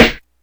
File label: good acoustic snare sound a sharp key